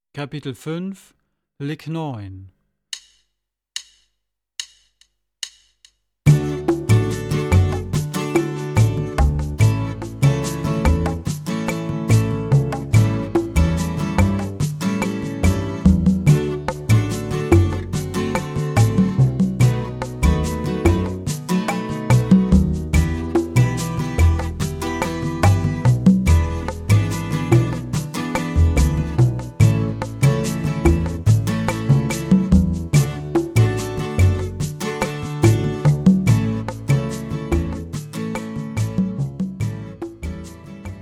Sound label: Latin Style Guitar